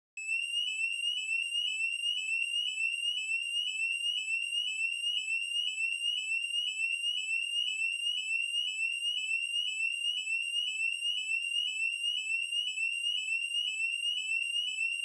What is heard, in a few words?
Alarms, Electronic, Fire, High Pitch, Emergency; DeltaVR; FMOD